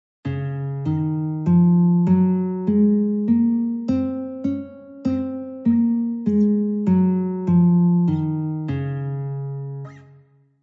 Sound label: Db Scale Guitar; California Music Conservatory